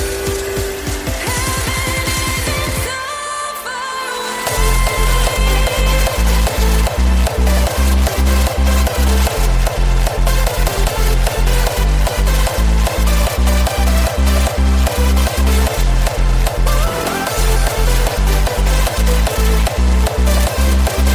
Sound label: Hardstyle